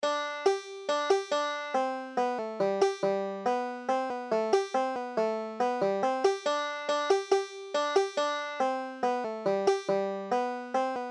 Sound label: banjo